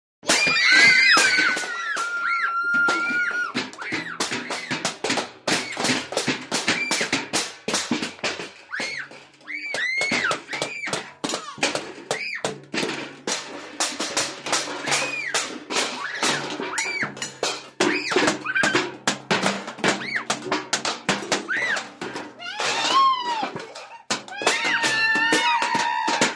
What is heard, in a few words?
6 Yr Olds Yelling Bang